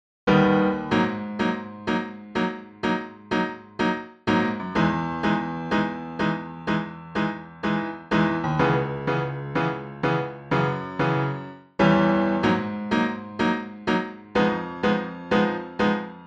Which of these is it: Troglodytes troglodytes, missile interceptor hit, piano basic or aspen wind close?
piano basic